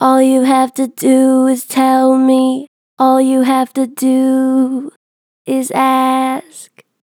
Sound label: Gen Vib All you have to do, Vocals, Natural Vibrato Gentle; Harmor Vocals